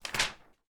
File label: Page Turn